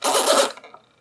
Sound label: dials